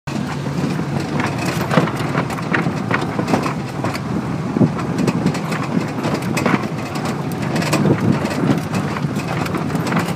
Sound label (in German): Lastwagen